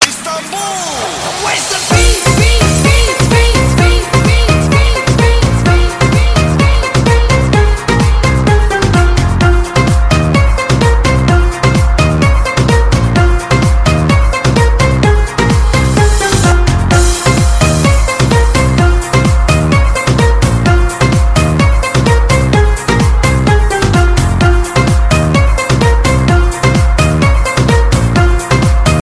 marimba ringtone; la marimba

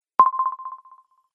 drip echoony ringtone

